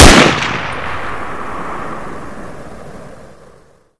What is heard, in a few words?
tommy shoot, weapons